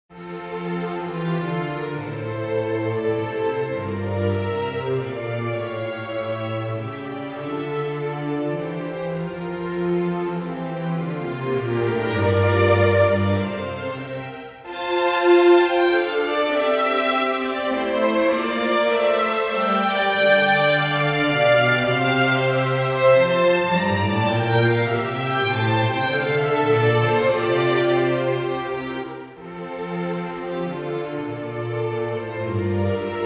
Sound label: orch